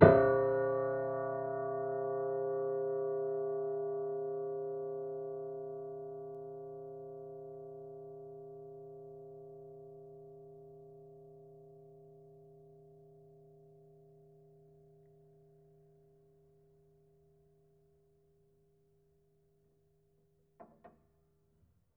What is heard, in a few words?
Piano Harmonics, Harm Low Mid